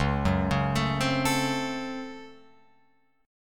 C sharp Major 7th Suspended 4th Sharp 5th C sharp, Guitar Open F; arpeggiated; chord; 1,1,1,4,3,4